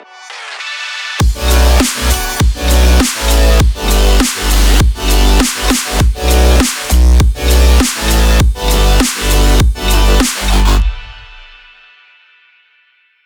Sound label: energetic pop